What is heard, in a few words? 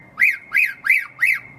car alarm bird; No Agenda